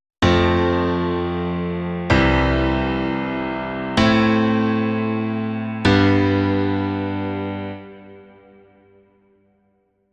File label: piano